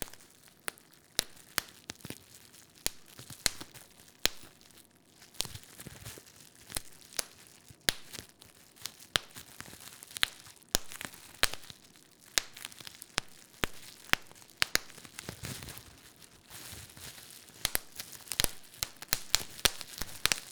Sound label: popping bubbles, household sound effects